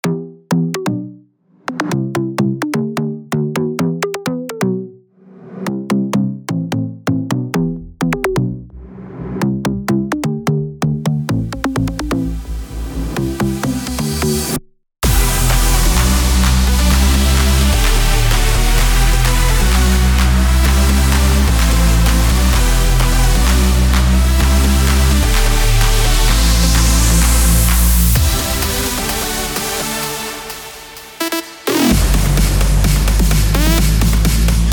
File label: sick big room